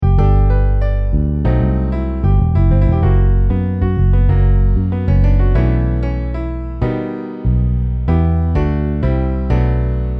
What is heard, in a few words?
Piano Bass; IRE PRODUCTIONS